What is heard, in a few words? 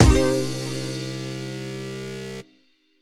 Retry Jingle; friday night funkin vs. dave and bambi omelette edition; family computer